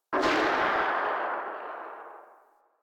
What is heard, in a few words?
Inf Vomit Gun Tail; Warframe